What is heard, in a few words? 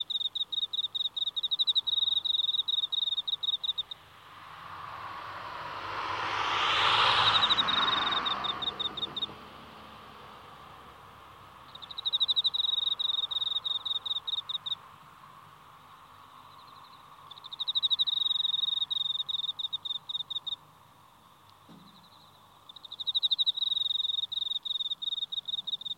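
enmakoorogi, cricket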